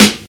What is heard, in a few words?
airy snare drum g sharp key; snare drum one shots